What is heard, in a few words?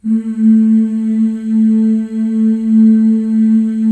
FEM, Symphony of Voices; Spectrasonics